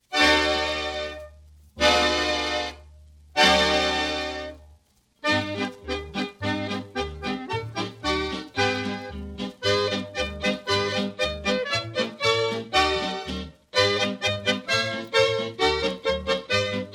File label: Turn Around Me, Czech Rep